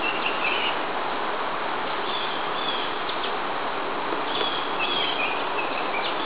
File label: blue jay; birdsongs